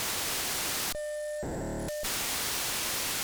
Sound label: pin8 continuous